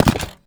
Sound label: Slot Machine; TaTlak